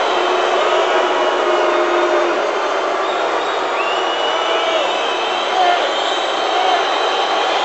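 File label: Basketball Crowd Jeer Loop; Crowds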